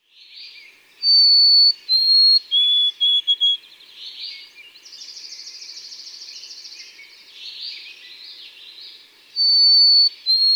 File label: White-throated Sparrow